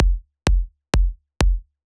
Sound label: EDM KICK